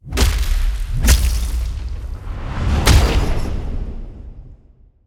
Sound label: boxing powerful